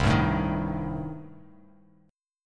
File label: C Minor 6th; Cm6 strummed; JGuitar